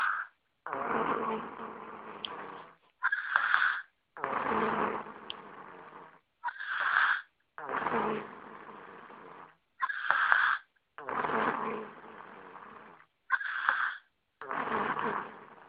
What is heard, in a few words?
Snoring Kid